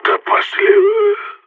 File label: death; Counter Strike; CS:GO; phoenix